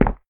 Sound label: Tile short; Devour